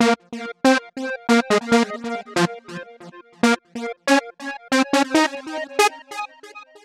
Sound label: Sparkly Lead